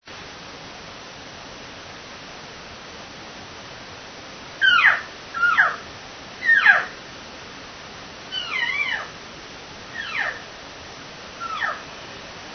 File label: cow, elk; elk 2001